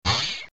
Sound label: Sproing; Myst